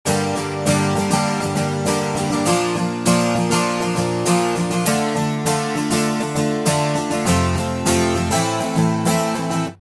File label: Acoustic